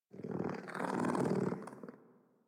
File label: Kitten Growly Purr; Enemies; Warframe; Catbrow